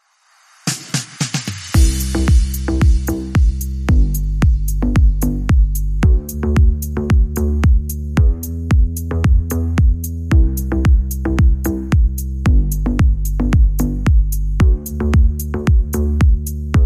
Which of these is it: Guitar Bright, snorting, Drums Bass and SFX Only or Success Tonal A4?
Drums Bass and SFX Only